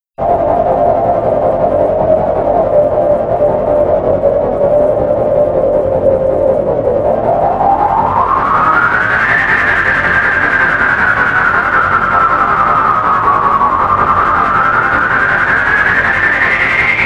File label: Filtered sphere